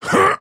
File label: attack effort small; dota; axe